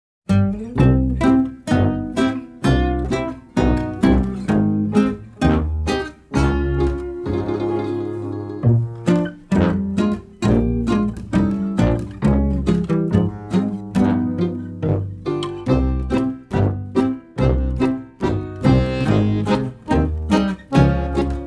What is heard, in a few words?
Invasion Score South Slavic; Bavarian